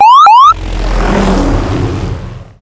Gear

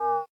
Window Close; Unending Dusk

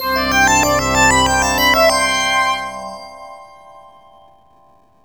Win Game

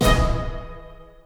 UI Transform

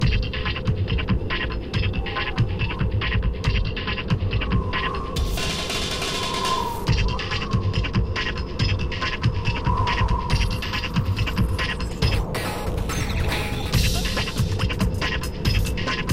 Action Music Outdoors; True Crime New York City; Xbox

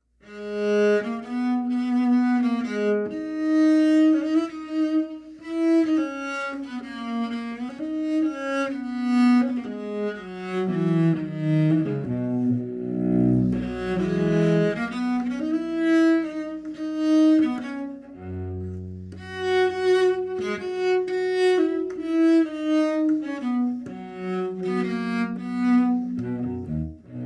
Contrabass; Assassin's Creed Syndicate

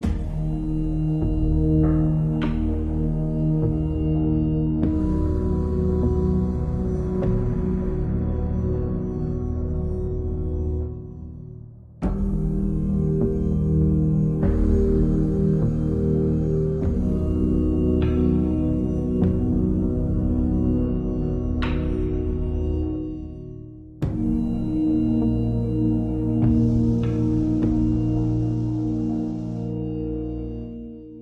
Loop Tense; the walking dead season one

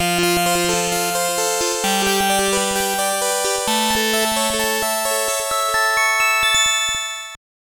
Jingle

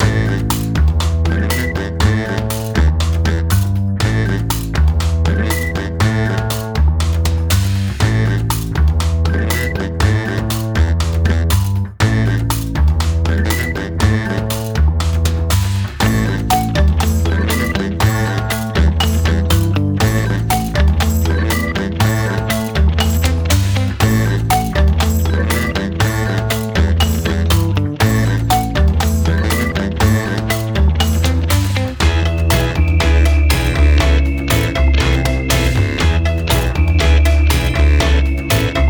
Round 2 Write Music; Jackbox Party Pack 3; Quiplash 2

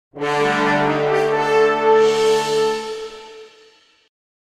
Travelling Jingle; Asterix at the Olympic Games

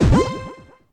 Alert; Garfield's Diner; Android game music

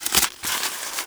CARDBOARD Open Box; mono; Don't Be Afraid The First Toy

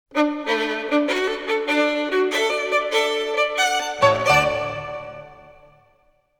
violin concerto; Violinist of Hameln; beethovens

